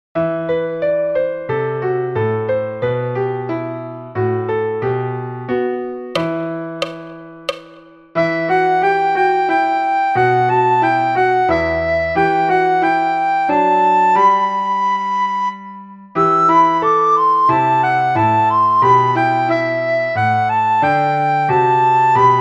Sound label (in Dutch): sopraan; Flierefluiter 5